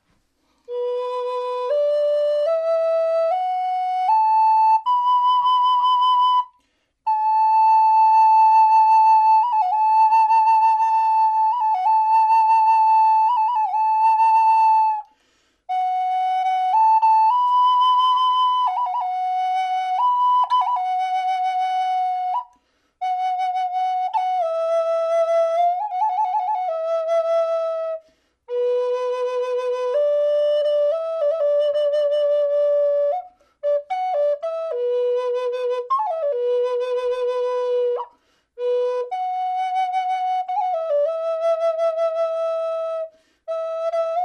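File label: natural, flute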